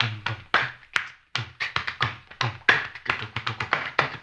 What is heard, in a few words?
hambone; ILIO; Vocal Planet VOL-2; Blues & Soul